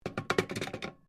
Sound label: PHONE CAPTAINS OFFICE RECIEVER DOWN, Sounds onboard HMAS Castlemaine